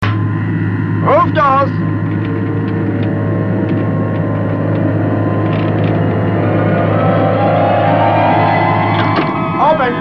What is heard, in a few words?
Roof Doors Open